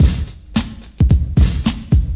big swing rap, 108 bpm, drum loops and breaks; 101 to 110 bpm

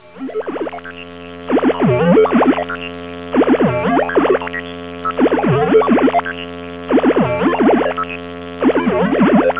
computer

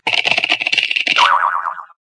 shuffle

